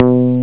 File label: bass gui; AndroidArena